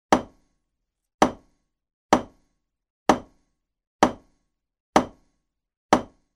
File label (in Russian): djubel